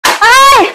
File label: scream; wanpatan